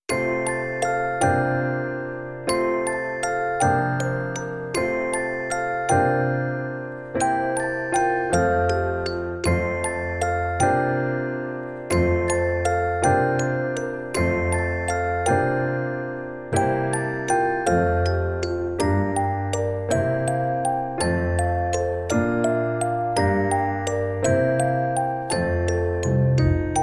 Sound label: Light Version Melody and Piano